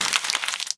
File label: trash